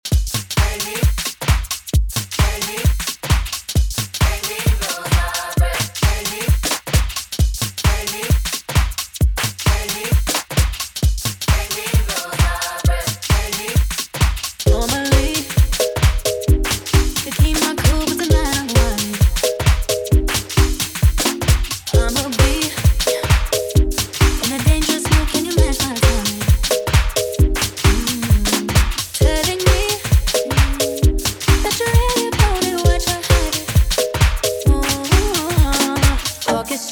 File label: water, UKG